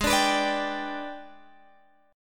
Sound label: G sharp Minor Major 7th Flat 5th, Guitar, Open G; 18,19,21,19,20,x